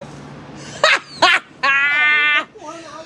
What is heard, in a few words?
ha ha haaaaaaaaaaaaaaa